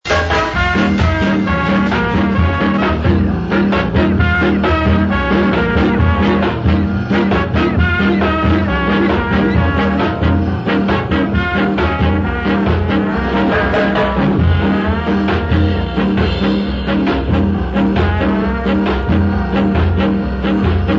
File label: ska